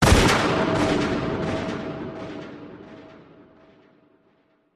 oruzhie vistrel s effektom otrazheniya

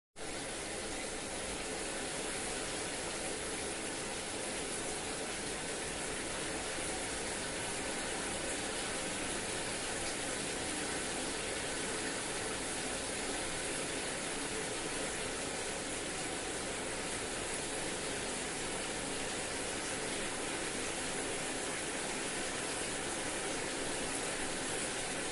zvuk begushego dusha

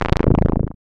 ejsid haus odinochnij richashij

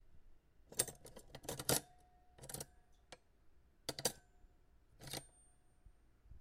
vzyat neskolko vilok iz yashika dlya vilok

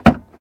avtomaticheskoe nezametnoe zakrivanie dverej

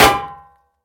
zvonkij metallicheskij gromkij zvuk

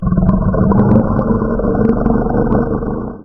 dovolnoe murlikanie ogromnoj koshki

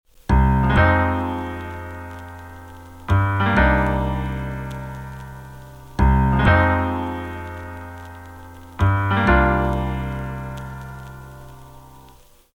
vinil dzhazovij sempl

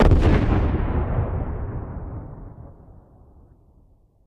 torpednij vistrel iz povodnoj lodki

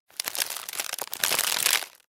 medlennoe netoroplivoe otkrivanie pachki chipsov

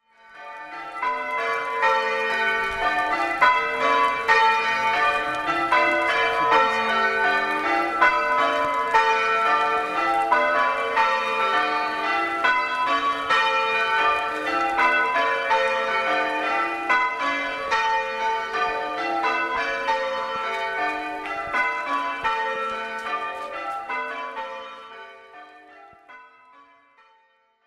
melodiya kolokola v zapisi

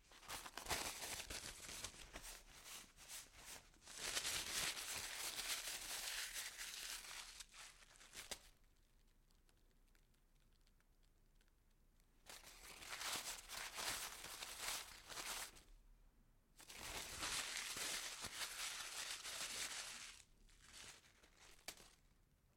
zvuk bumagi bumazhnij shelest

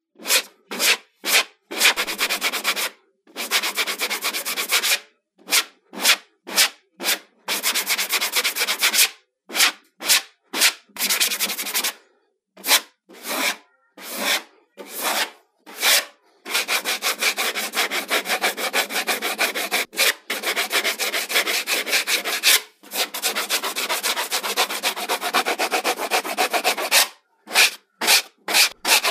skrebok dlya sten ili grunta plastikovij skrebok

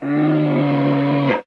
gromkij zlov ryov vzroslovo medvedya

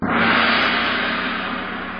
gong odinochnij yarkij

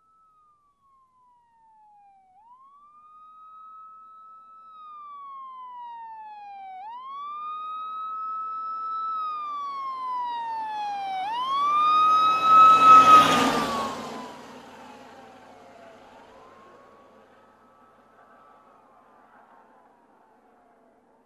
pozharnaya brigada proezzhaet mimo s sirenoj

